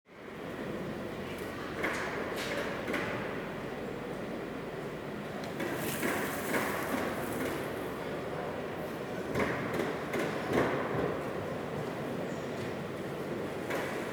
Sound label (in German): Messehalle Aufbau